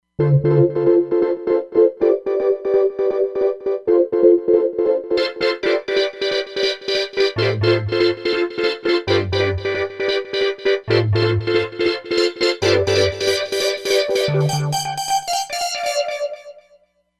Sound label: Roland Fantom XR